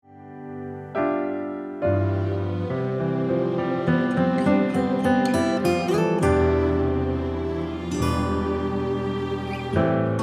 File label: Instrumental